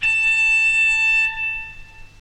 distant bleep

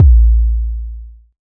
verby bass drum sound a sharp key; Duration 0:01; Bitdepth 24; kick drum samples

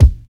warm steel kick drum D key

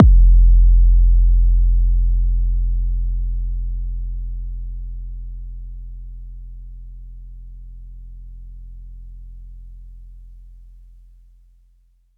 phonk kicks oneshot 808 F; trap kick drums

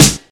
Studio Snare D Key; snare drum samples

